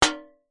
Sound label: impacts metal tray hit muted hard